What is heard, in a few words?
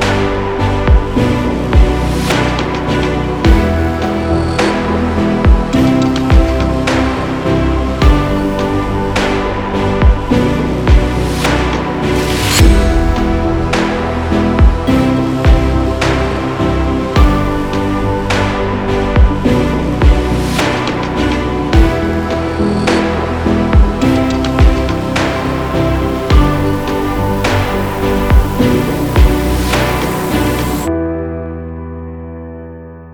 tension, horror excitement thriller song